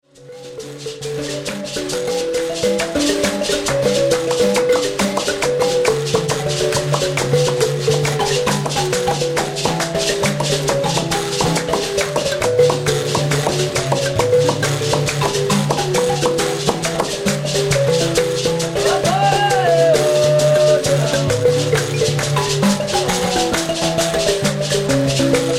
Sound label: mbira